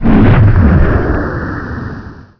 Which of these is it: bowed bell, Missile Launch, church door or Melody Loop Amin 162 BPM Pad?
Missile Launch